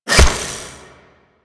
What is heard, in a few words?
Rain Of Arrows Impact; Warframe; Duviri; Duviri Dax; Abilities; Dax Archer